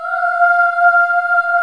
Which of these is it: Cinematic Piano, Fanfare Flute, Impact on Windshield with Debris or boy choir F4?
boy choir F4